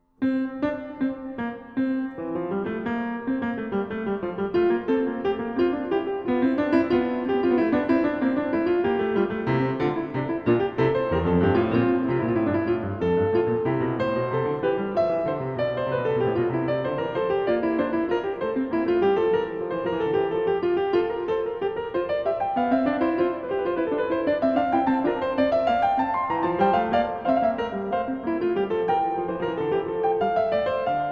Fuge F-Dur; BWV 856; J.S. Bach; Das Wohltemperierte Klavier Book 1

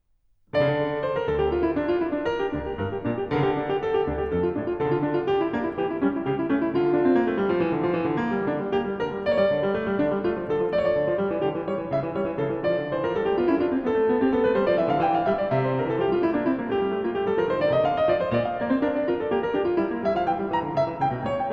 Präludium d-Moll; BWV 875; J.S. Bach; Das Wohltemperierte Klavier Book 2

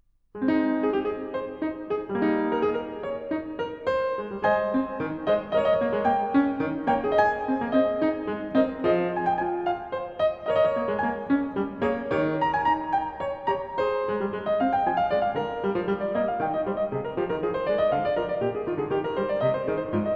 Präludium As-Dur; BWV 862; J.S. Bach; Das Wohltemperierte Klavier Book 1

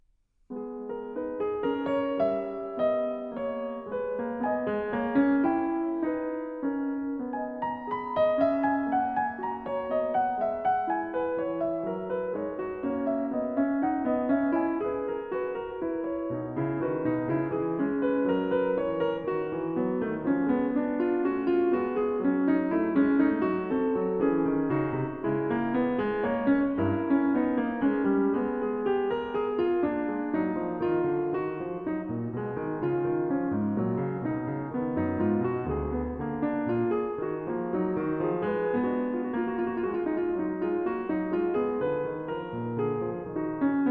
Präludium gis-Moll; BWV 863; J.S. Bach; Das Wohltemperierte Klavier Book 1

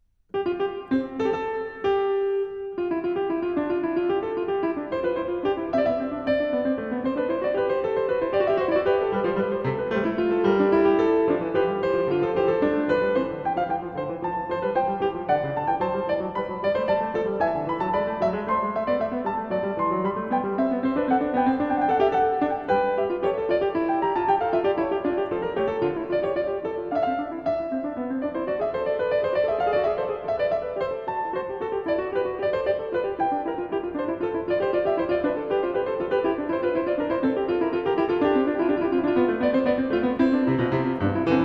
Fuge C-Dur; BWV 870; J.S. Bach; Das Wohltemperierte Klavier Book 2

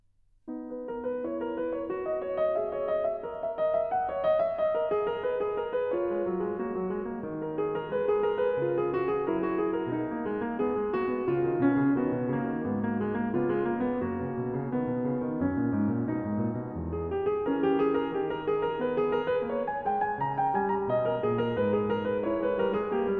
Präludium H-Dur; BWV 868; J.S. Bach; Das Wohltemperierte Klavier Book 1

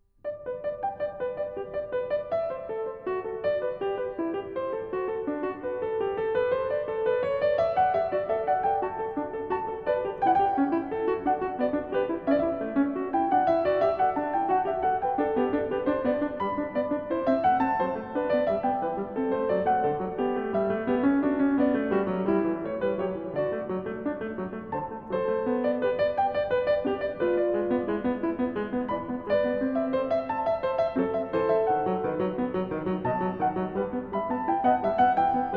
Fuge G-Dur; BWV 884; J.S. Bach; Das Wohltemperierte Klavier Book 2

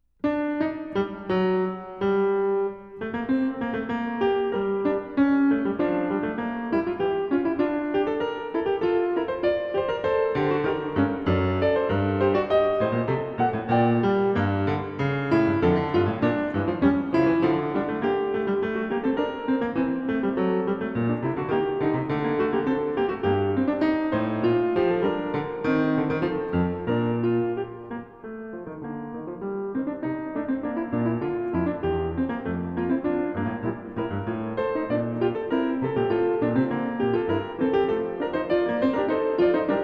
Fuge g-Moll; BWV 861; J.S. Bach; Das Wohltemperierte Klavier Book 1

